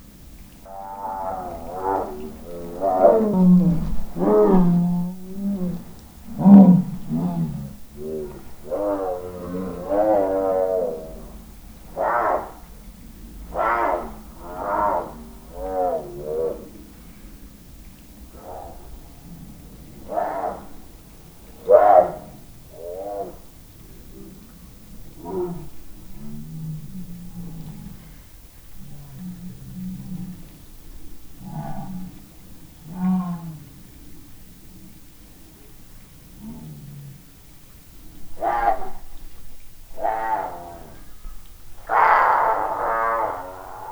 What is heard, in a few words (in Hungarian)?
hatterben csobogo viz szelvedo, leopard fekete jaguar; állathangok; nyíregyházi zoo 2010